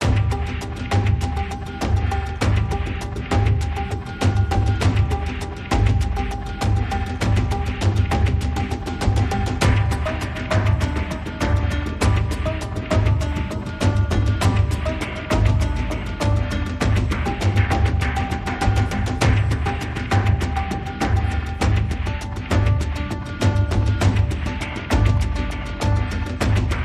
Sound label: Percussion Only